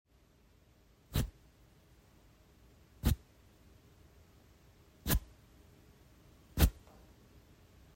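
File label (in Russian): маркер протащенный по полотенцу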